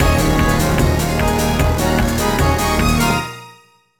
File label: BGM STAGE BATTLE; Phantasy Star Zero